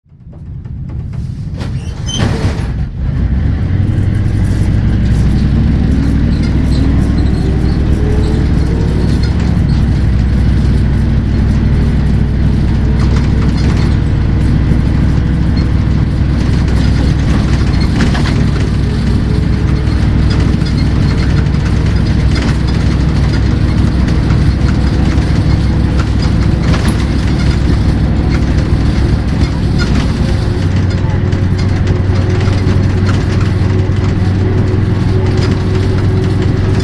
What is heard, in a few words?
bus LiAZ 677; avtobus